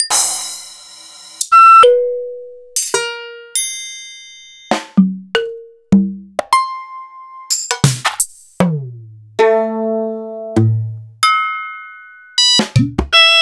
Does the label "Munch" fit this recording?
No